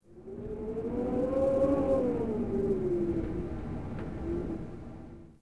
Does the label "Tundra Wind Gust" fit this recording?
Yes